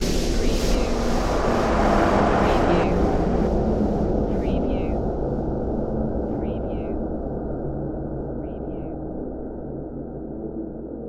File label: Hit Swell